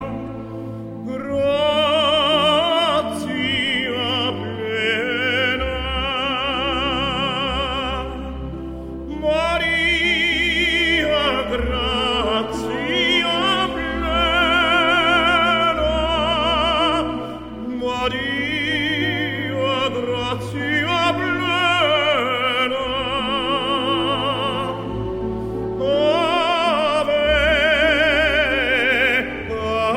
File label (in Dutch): 3 baritons Ave Maria applaus op eind